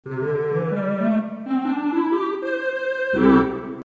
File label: 8 Tibia 8 String 8 Clarinet 2 2/3 Tibia, theatre organ